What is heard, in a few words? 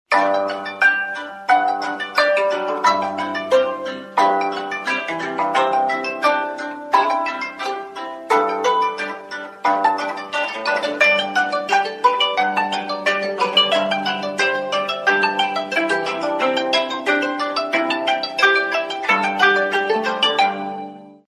midi ring